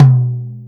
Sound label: Tom BD